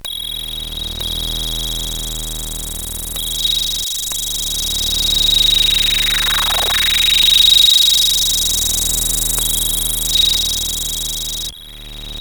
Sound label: Jingles, CSG8580R5